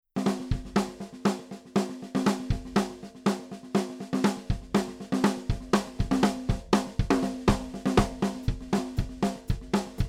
Drumbeat mit BD Mikro unbearbeitet

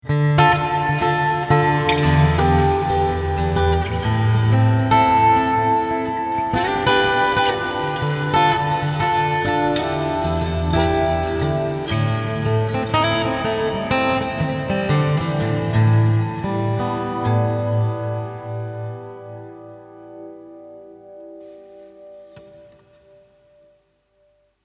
Hall

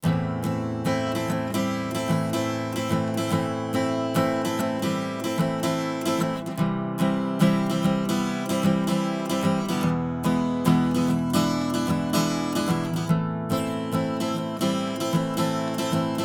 Gitarre ohne Comp.Two

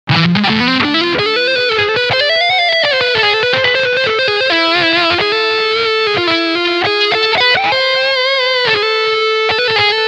Leadsound beide PUs

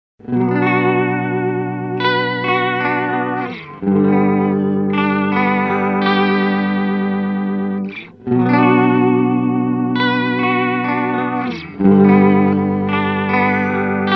EQD Aurelius Mode V width 10 uhr rate 13 uhr balance 3 uhr

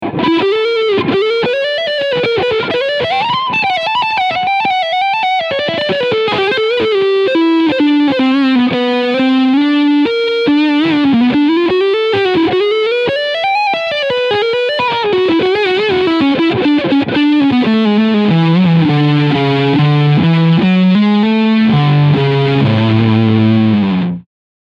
Dean Guitars Exile Select E Gitarre Leadsound Front Pickup

